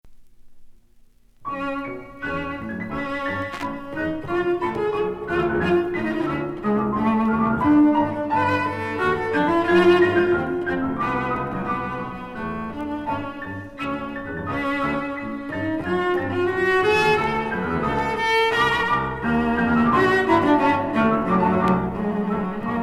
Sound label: SONATE FOR CELLO AND PIANO